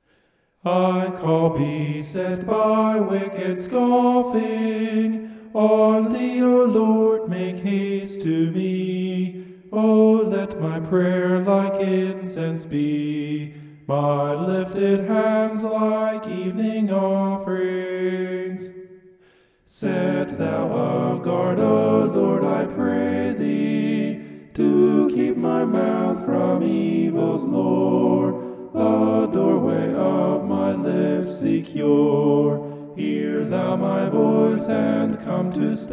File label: vocal homoph; psalm 141